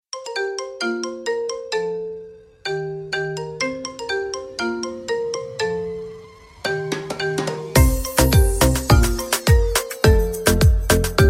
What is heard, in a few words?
marimba tropical life marimba remix